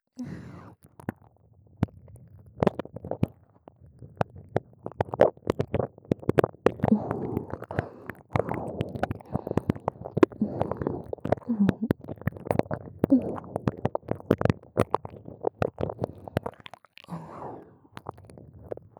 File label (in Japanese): 耳奥舐め(右耳)